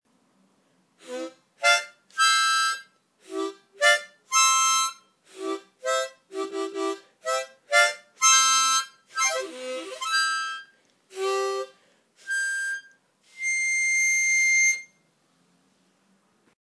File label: new harmonica